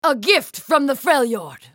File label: ultimate effort; Sejuani; Character Voices - English